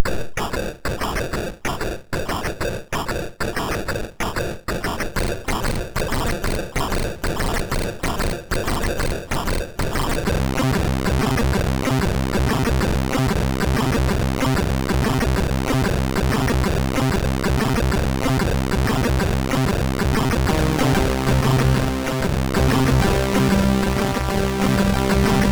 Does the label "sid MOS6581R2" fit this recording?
Yes